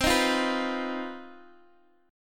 C Minor Major 7th Double Flat 5th chord, Guitar Open D